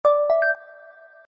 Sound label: Dragonfly, notifications; Tinno; ATT Calypso